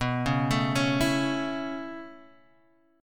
B 7th Suspended 2nd Suspended 4th down Guitar Half Step Down, chord